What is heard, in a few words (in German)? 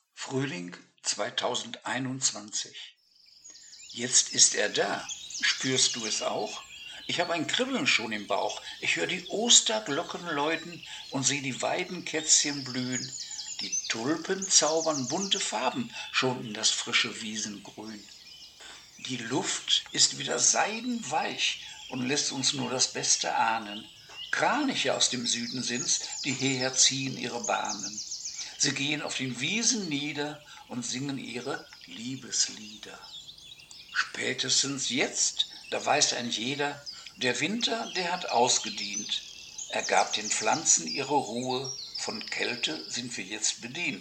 Frühling Vögel